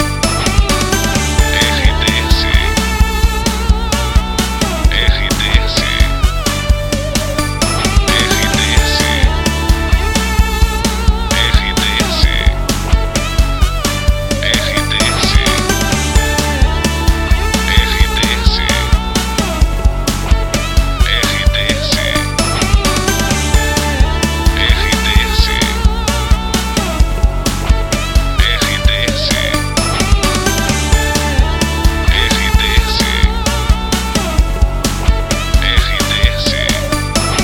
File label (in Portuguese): TRILHA PARA LOCUCAO